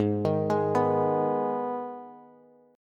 G sharp Minor G sharp down Bass, chord; G#m arpeggiated; JGuitar

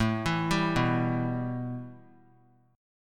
A 7th Suspended 2nd; A7sus2 arpeggiated

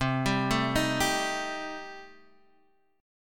C Minor 6th, chord

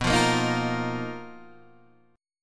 B Major 7th Flat 5th B down Guitar DADGAD

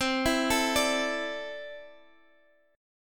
C 6th Add 9th, C, down; C6add9; arpeggiated

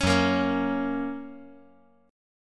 Gb Major

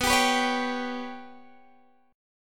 G Major Flat 5th chord; GMb5/B; strummed

